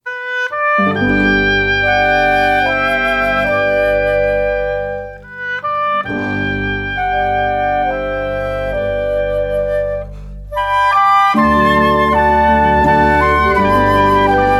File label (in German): menuett